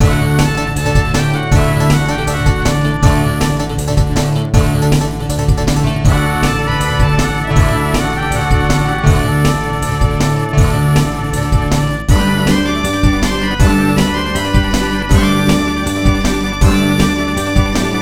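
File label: background music fast; My Frogger Toy Trials; Nintendo DS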